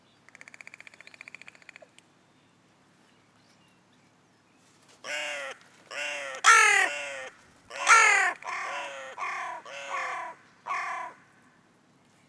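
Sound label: hooded crow corvus cornix cornix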